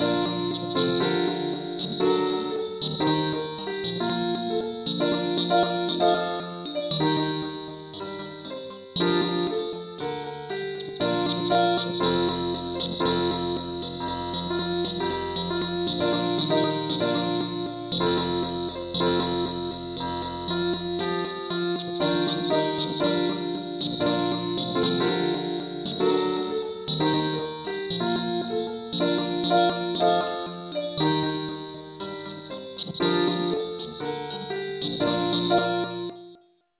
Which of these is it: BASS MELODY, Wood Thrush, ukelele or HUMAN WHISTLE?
ukelele